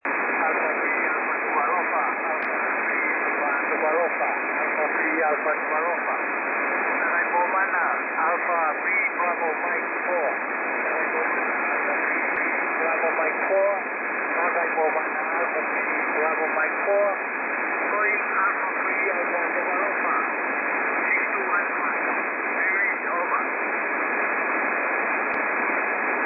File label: radio; nuku'alofa radio